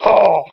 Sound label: dwarf die; wesnoth